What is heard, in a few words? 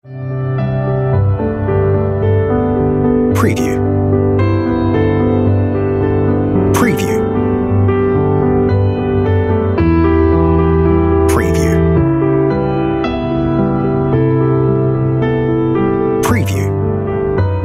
On Hold Music